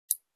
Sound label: Scissors Snip